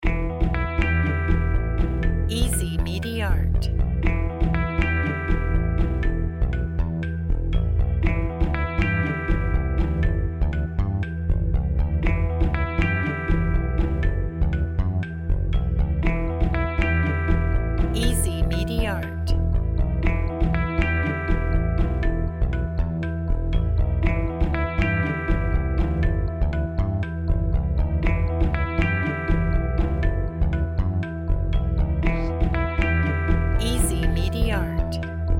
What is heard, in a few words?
foreboding cinematic loop